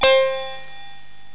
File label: oriental; OctaMED SoundStudio V1; jazzies samples